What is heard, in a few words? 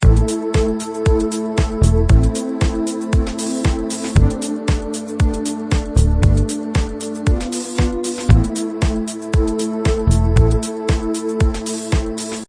Floatation - 8 bar loop w drums; upbeat